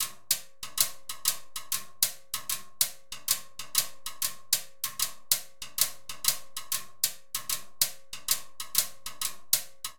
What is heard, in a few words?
Songo Timbales 96bpm, Latin Percussion; Ableton; Factory Packs